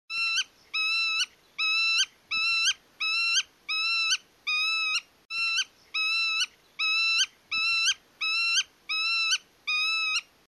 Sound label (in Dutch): grutto; lente